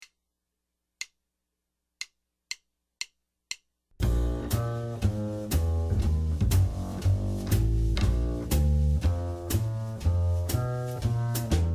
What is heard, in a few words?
Bb Blues